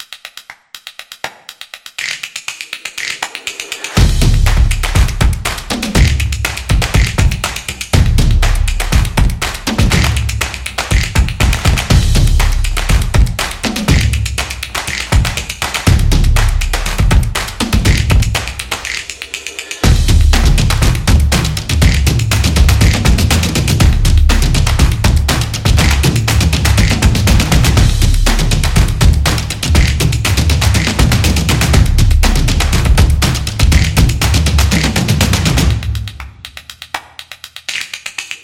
Percussion Upbeat; upbeat drum

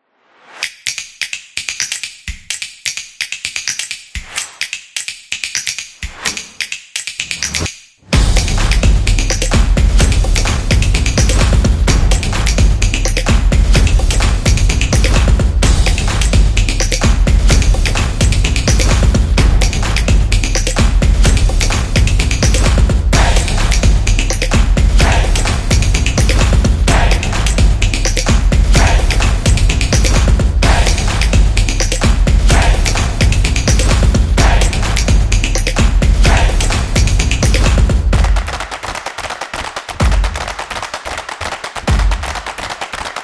Claps Drums; percussive nation vol 10

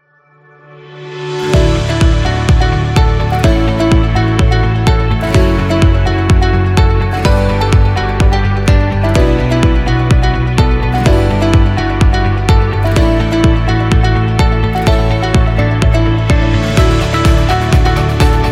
Energetic

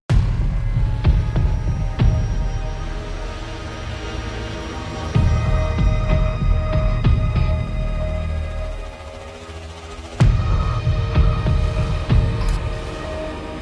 Fearful

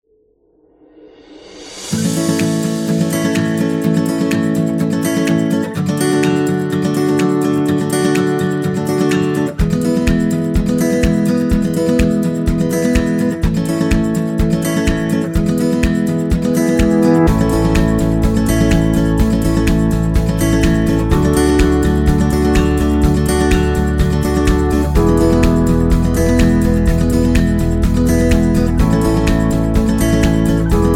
Happy Uplifting Acoustic Guitar; happy music vol 6

